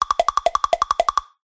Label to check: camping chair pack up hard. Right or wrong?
Wrong